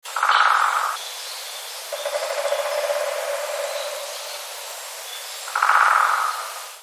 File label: drumming